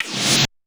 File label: Korg 01W MegaDrums; 300 Drum Machines